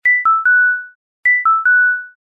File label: Oven Door Open Buzzer